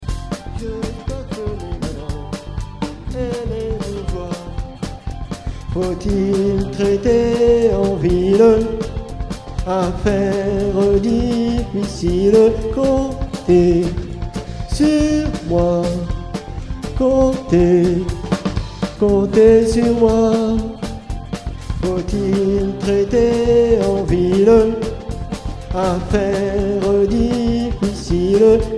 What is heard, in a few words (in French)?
basse